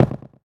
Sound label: Gallop; Lord Bullbo's gallop (3 sounds heard in succession); Eldin